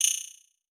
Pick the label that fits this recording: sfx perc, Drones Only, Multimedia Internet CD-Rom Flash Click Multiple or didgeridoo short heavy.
Multimedia Internet CD-Rom Flash Click Multiple